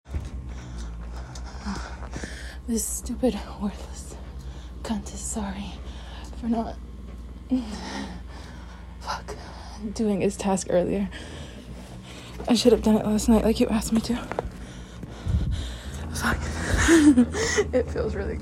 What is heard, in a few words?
wooden handle grinding